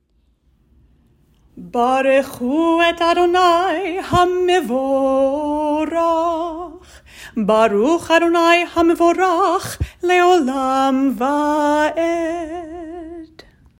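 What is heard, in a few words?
Barchu, prayer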